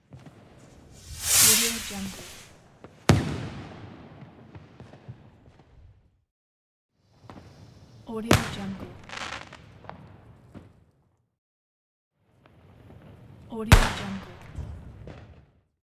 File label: fireworks